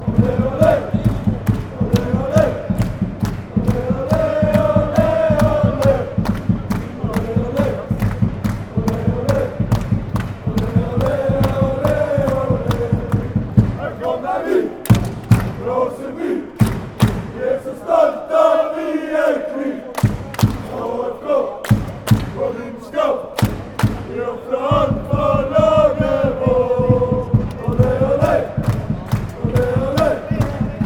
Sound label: chant; aalesunds